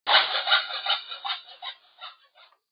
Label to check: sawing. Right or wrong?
Right